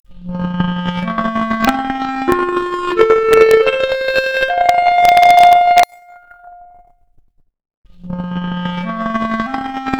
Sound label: Clarinet arp, Stereo output noised; 4bit